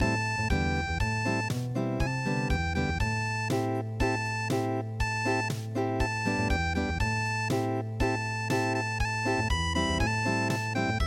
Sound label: unpluged